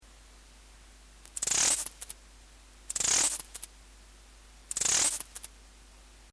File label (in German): Abendsegler Balztrill Heterodyn